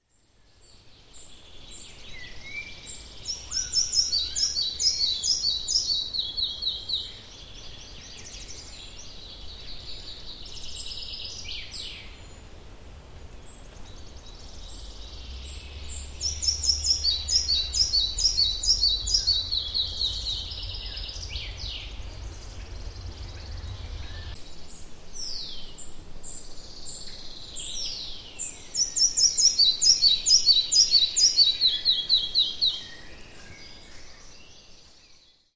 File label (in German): zwergschnaepper